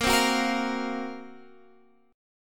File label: F sharp Major 7th Flat 5th A sharp